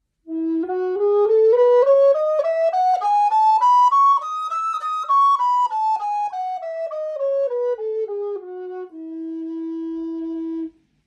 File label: Transitional Alto F recorders; Prescott